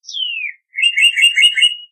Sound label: cardinal calling